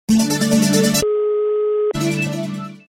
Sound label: Jingle; Blaupause